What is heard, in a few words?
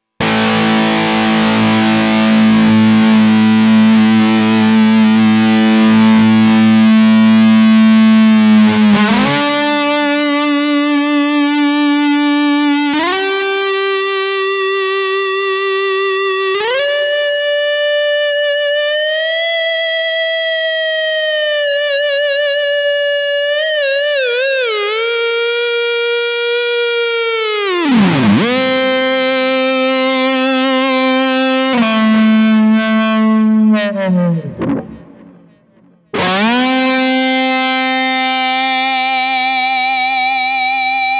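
Sustainiac Bridge Pickup Highgain, guitar; Schecter C-1 FR-S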